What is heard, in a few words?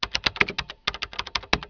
KEYBOARD; Microsoft Office 95 Professional; VALUPACK